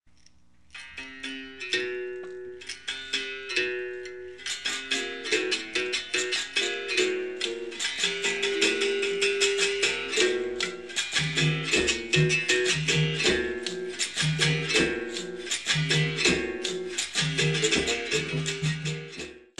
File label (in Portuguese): Toque de sao Bento Pequeno